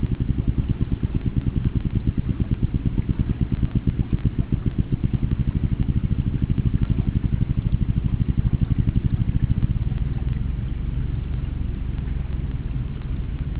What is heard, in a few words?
Melanogrammus aeglefinus Knock